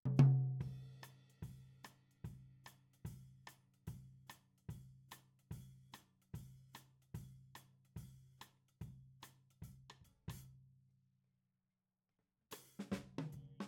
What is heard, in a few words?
Tom